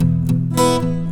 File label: Uh Huh, Rock Guitars Acoustic Loops; ACID MUSIC